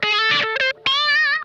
NOSE LICK, Funk Guitar; Zero G - Funk Guitar

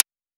Woodblock; LIMAT Div Dept; Drums

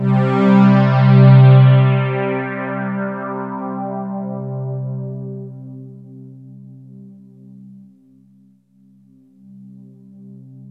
JV-1080

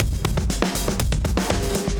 Beat Style, 120bpm, breaks

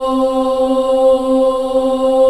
C3 CHILD OH, Choir; USB Soundscan vol.28; Acoustic & Synth; AKAI

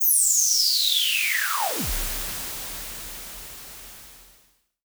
Virus Noise

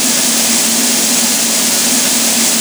A#3 SNARE; Northstar; The Wizard VOL-1; DRUM INERTIA

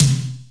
TOM, Drum Dry; East Collexion; Drum 1

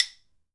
KALAAW, HAWAII PERC

